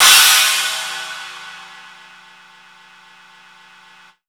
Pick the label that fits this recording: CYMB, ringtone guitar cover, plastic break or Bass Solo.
CYMB